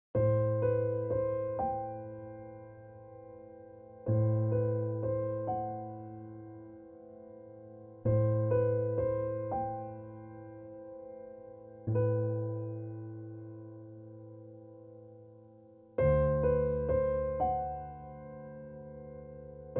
Slow Songs Meditation Prenatal Yoga Music Background